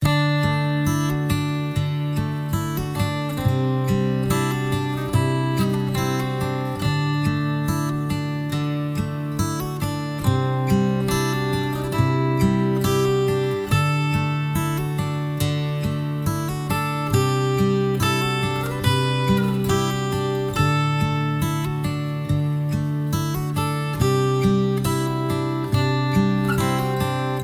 Acoust Reflective IV (LOOP); MIUI14 and HyperOS 1

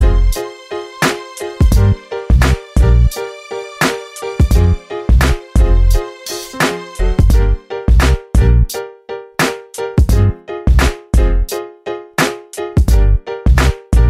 Instrumental; Wind Blow; MIUI12

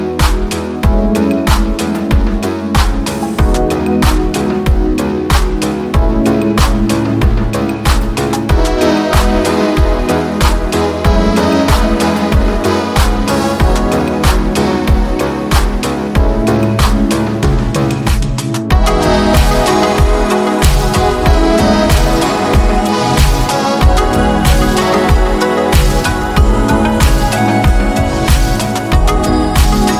80s Retro Street; MIUI12

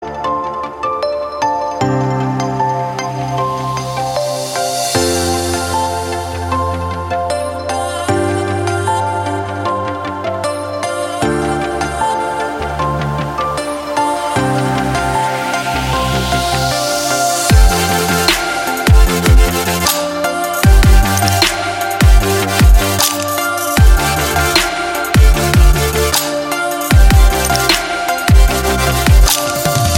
Motivational Modern Technology; MIUI12

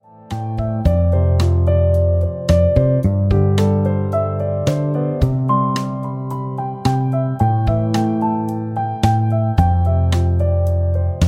Beautiful and relaxing; MIUI12

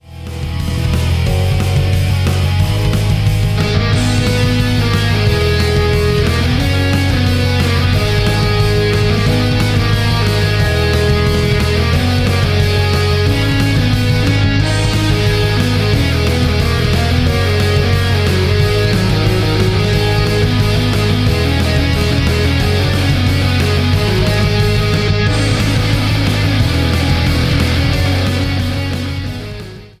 Intense metal music, ringtone; MIUI12

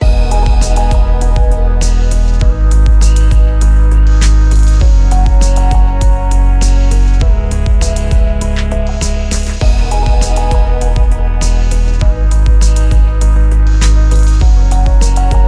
Inspiring Technology Loop; MIUI12

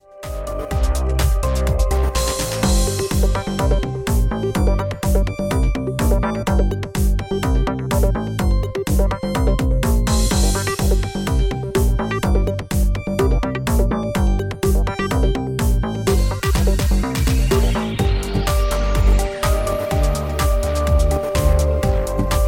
A deep rhythm

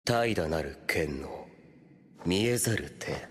Anime Notification; MIUI14 and HyperOS 1.0

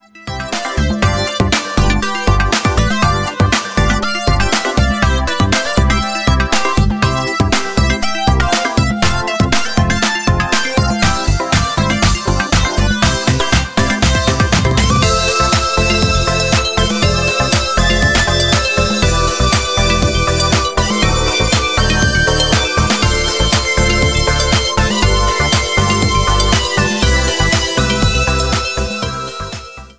Rhythm animation light electronics; MIUI12

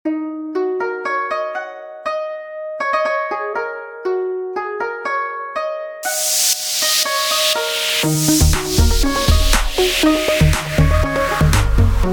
disco

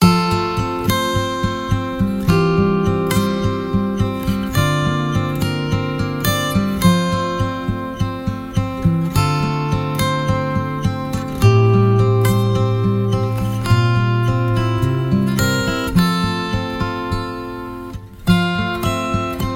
Acoust Reflective II (LOOP); MIUI14 and HyperOS 1

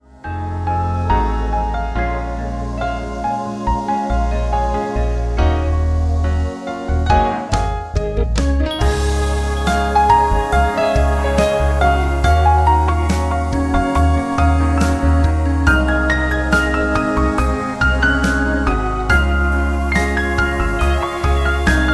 Romantic Jazz; MIUI12

